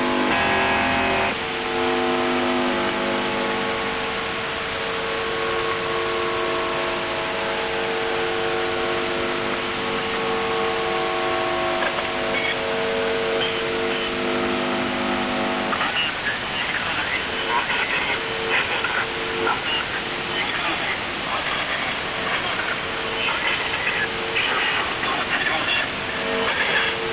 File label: buzzer usb msg